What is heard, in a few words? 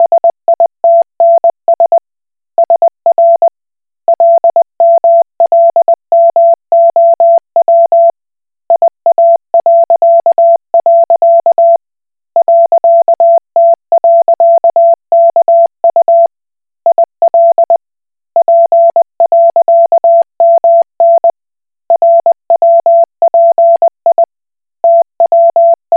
Koch Morse